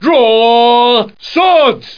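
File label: sword; lords2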